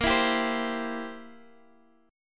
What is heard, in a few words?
C Major, chord; strummed; x,x,8,9,8,8; JGuitar